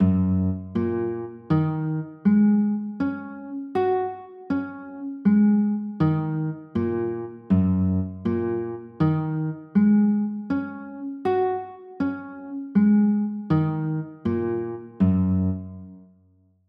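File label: G flat 6 chord, guitar; g flat 6 9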